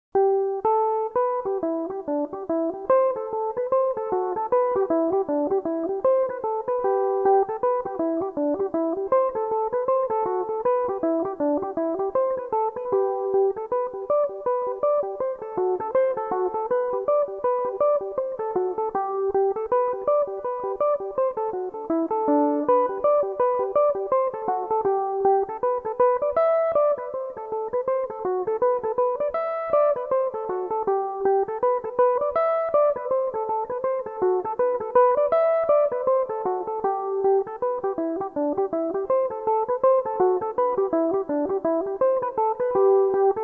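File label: g major, mandolin; Thirty Bottle High; Belmuse EM-190 electric mandolin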